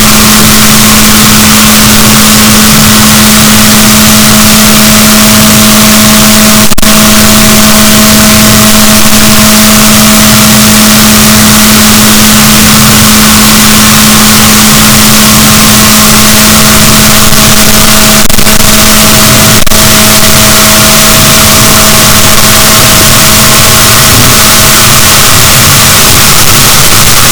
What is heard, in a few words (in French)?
rorquals negatif, Balaenoptera Physalus; boat; SNR -6dB